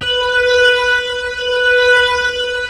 B, The Piano; InVision Interactive

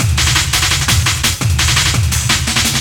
LOOP, Eurotech; Sounds Good

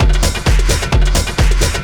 techno, DRUMLOOPS

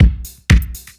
4 floor beat, trance

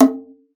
Conga, Session Instruments

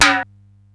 SDS9 RM, Simmons SDS-9, Rimshots

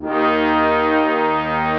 BRASS2 D3, BRASS; AKAI S-Series CD-ROM Sound Library VOL-8

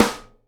snare GLASS, Live Snares

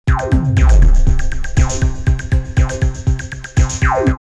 120, drumloops, DrumMachines; X-Static Goldmine

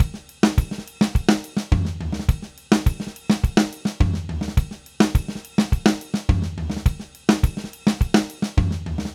Samba, Latin Percussion, Drumset; Sonic Foundry (Sony Creative Software); Joe Vitale Latin Percussion

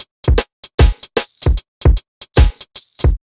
BT, Ragga & Dub Reggae

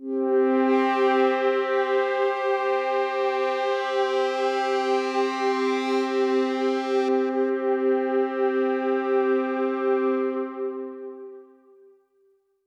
Pad C, Synth Prophet One Shots; Electronisounds

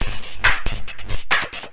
percussion loop 138, techno; PS-45

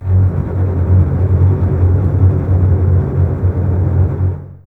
F#1, Basses Tremolo, String Ensembles; Miroslav Vitous; 90 s Sample CDs

